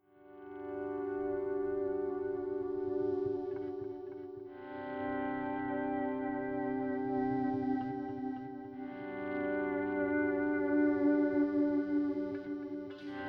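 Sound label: Country Guitar, Verse 1+2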